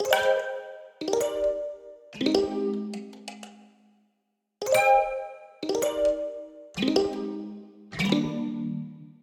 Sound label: Music Box Strum C Minor 104 bpm; MIDI Clips; Tonal; Ableton; Factory Packs; Lost and Found